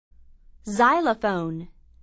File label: xylophone